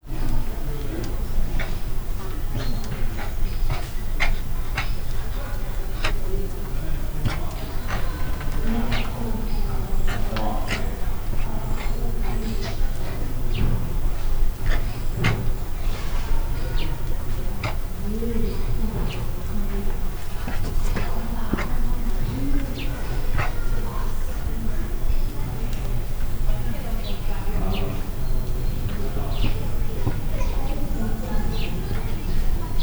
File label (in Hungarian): sivatagi hiúz, látogató, repülő; állathangok; miskolci zoo 2009